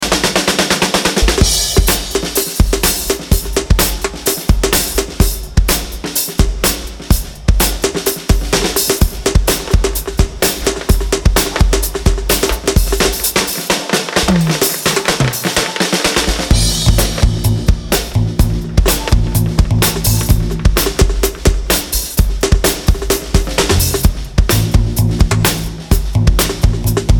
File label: Drum Dub